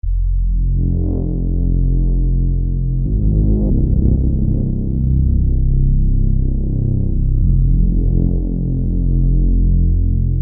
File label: drone; Oxium